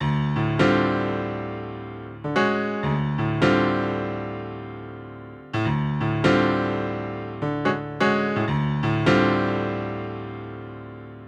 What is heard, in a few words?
085 Acid Jazz Piano Dmaj, Grand Piano; Digidesign; Pro Tools Essential Loops